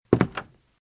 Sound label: Footsteps - Wood - Creaky - Walk - Left; RadwinIsland